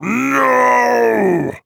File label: Orc Cleaver death; 普通兵种